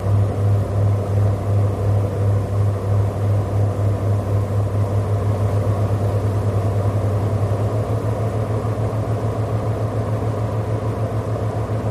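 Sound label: Stern Wash Coast Guard, bow wash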